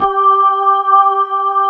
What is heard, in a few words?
B3 HAM, Keyboards Old School